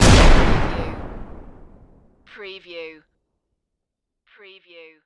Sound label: Explosion Blast; Sound Effects Bible